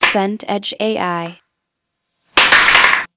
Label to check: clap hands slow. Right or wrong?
Right